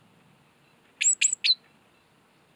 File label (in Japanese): シジュウカラ, 【あたりは異常なし!安全です。】; ある個体が餌場で採餌中、数メートル離れた場所で他の個体 が鳴き、餌場の個体は採餌を続けているとき鳴く; Parus minor